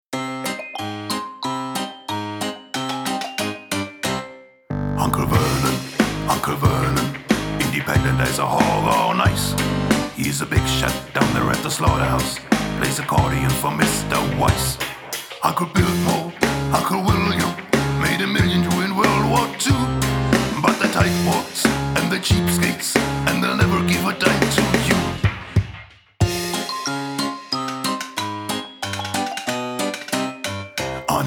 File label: polka; dolphin blue cemetery